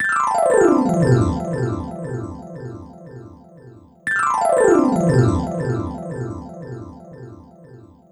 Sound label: Gliss 2fer Gliss, lounge, 118; Zero-G Kocktail Kollection; Construction Kitz (Lounge); Gliss 2Fers Mixes 118